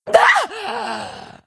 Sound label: Wound Crit; Lady Sacrolash